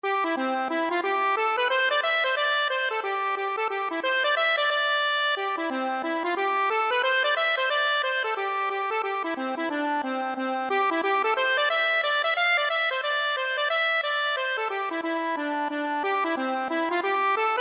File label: air, accordion; arran